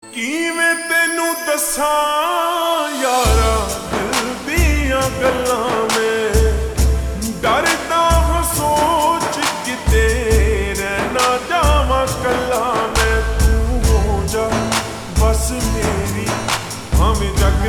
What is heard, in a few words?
Slowed Reverb